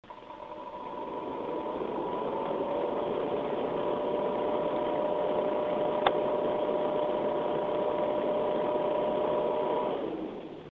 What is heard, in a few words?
cooler master aero low